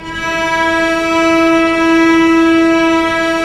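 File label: STR Cellos Ponticello, Bow FX; Roland LCDP08 Symphony Orchestra; STR Vcs Bow FX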